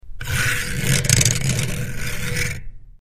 Metal / Plastic Scrape

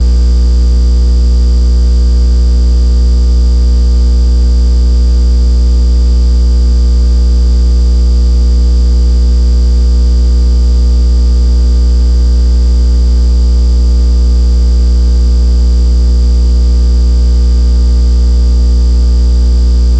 Fluorescent Light B, buzz & hums

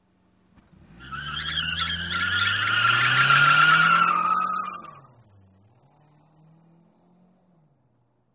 Sedan Idle Tire Sql

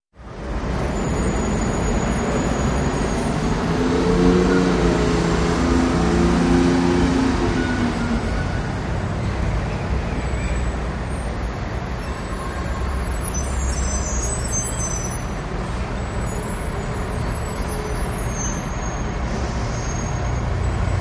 Traffic Gridlock, big city

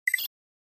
Flash Beep Dbl Elect

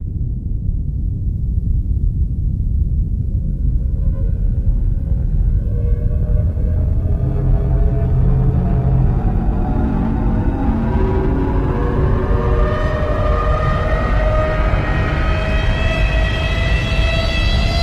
Starship Warp Drive

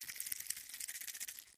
Bug Movement; bugs

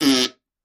Bowl Fart Resonant; farts

